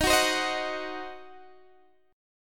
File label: Eb Minor 6th, Guitar Open D